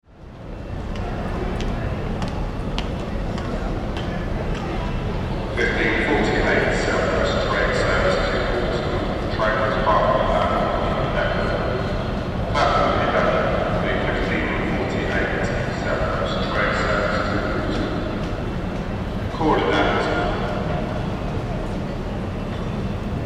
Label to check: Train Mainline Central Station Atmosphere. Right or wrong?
Right